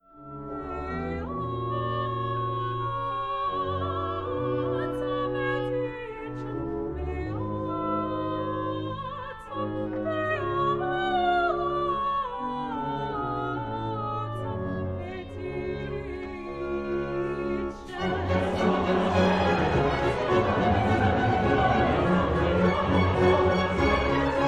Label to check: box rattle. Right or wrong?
Wrong